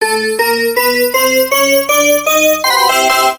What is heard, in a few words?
add free spin, slot; games; Fire And Ice